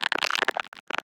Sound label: Chitter; Lethal Company